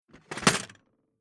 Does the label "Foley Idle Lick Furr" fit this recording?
No